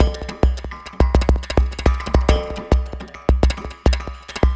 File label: Terrestrial Hybrid, Processed Percussion